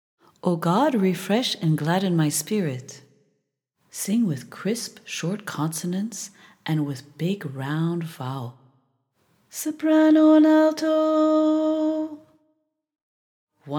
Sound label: soprano alto, choral music